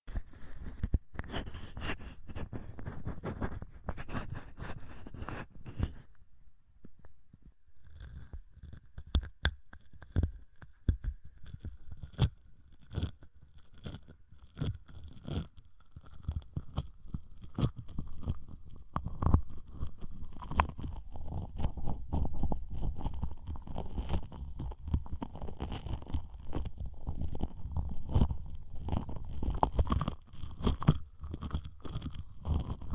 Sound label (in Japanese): 耳かき; 癒里奈パート